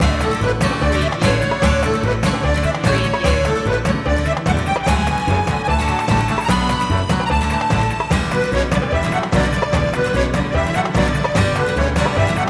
circus dance loop

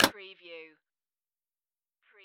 Pinball sound, bumper ka-klunk

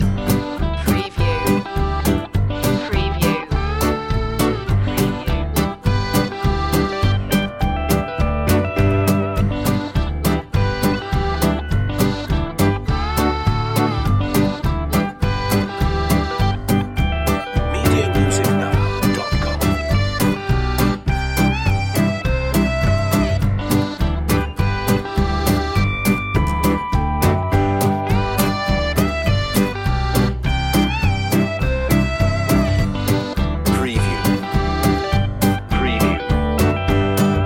two step loop

